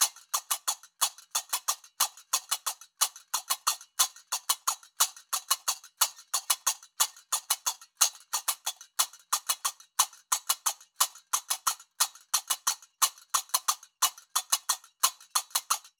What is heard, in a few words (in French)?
loop ternaire tempo 120; Qaraqab; qraqeb; Maroc